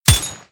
assault rifle shot

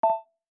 authent success

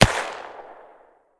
smg shot high

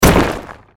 hit burst

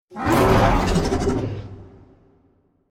roar trex

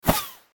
bullet flyby

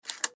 reload shotgun

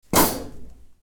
wall metal destroy

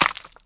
hit stone

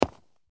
footstep concrete

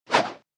swing blade

